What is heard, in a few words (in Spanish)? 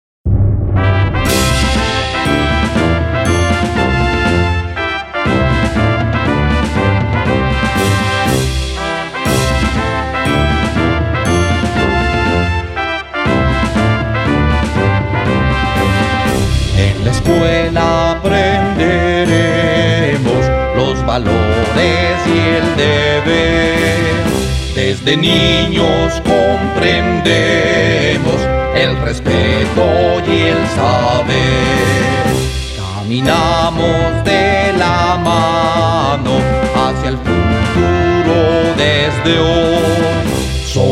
himno vocal; a san rafael; medellin colombia